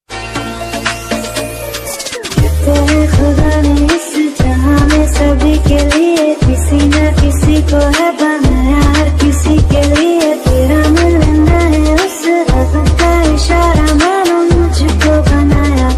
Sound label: DJ, hindi ringtone